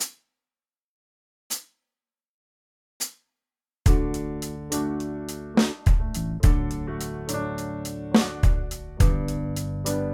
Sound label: away slow bpm; xmas